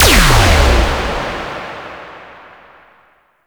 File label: Ground Laser Cast; Enemies; Weapons; Warframe; Tau; Sentient Dactolyst